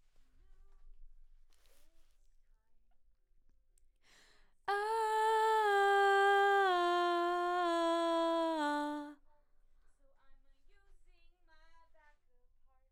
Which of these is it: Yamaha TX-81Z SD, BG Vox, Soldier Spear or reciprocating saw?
BG Vox